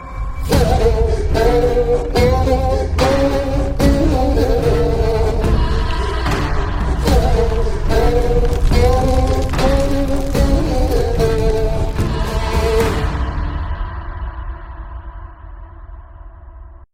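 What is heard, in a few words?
background music ringtone; CHAAVER TRAILER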